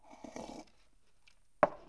napil polozil; Mafie 1

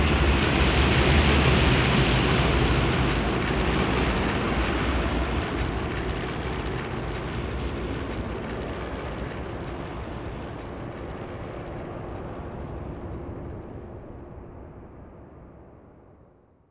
letadlo; Mafie 1

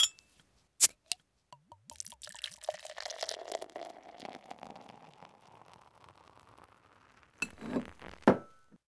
otevreni pivka; Mafie 1

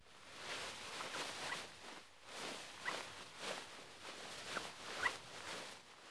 utirani; Mafie 1

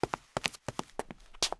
kroky; Mafie 1

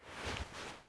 poposednuti; Mafie 1

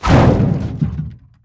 auto rana; Mafie 1

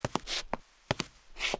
kulhá; samkulha; Mafie 1

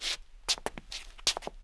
zmatek; Mafie 1

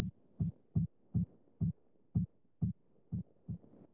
poklep; Mafie 1

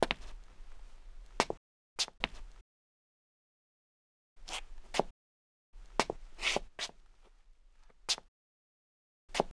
kroky kněz; Mafie 1

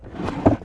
zebrik; Mafie 1

